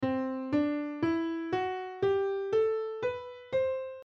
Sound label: lydian mode piano scale